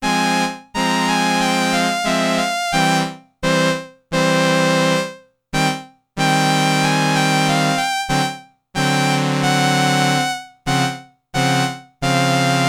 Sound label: STRINGS I with vibrato, Roland RS-202